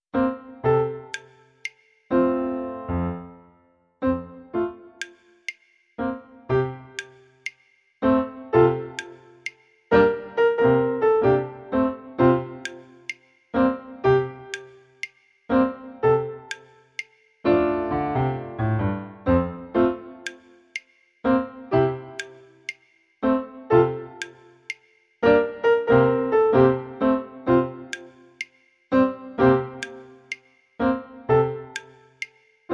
Het achtergronddeuntje van de Toekan; Muziekreis

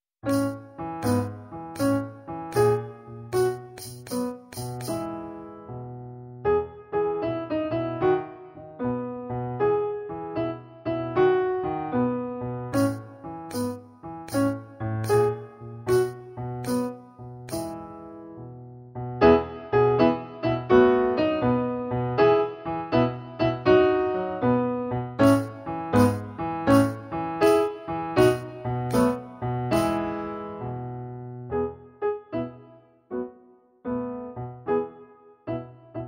Het achtergronddeuntje van de Kikker; Muziekreis

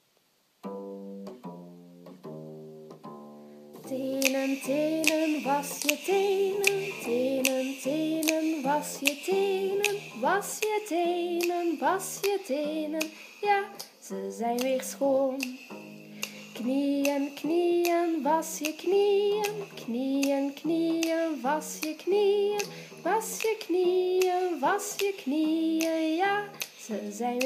Wassen; NL; voorbeeld

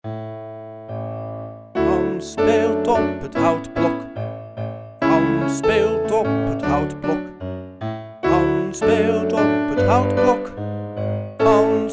Speel op het houtblok; muziekprogramma; Music Time

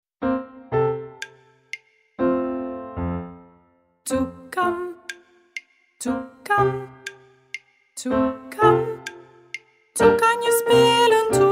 Het lied van de Toekan; Muziekreis

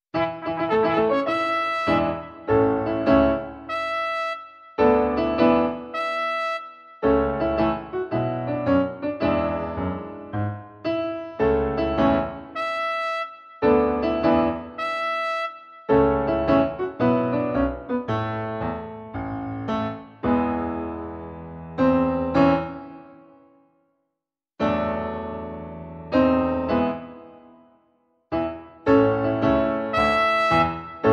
Het achtergronddeuntje van de Kever; Muziekreis

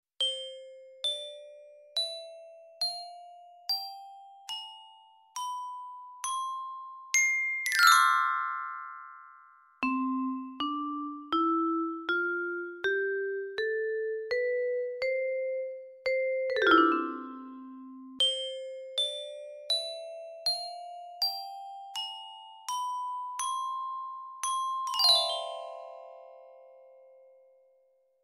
Het geluid van de Xylofoon; Muziekreis

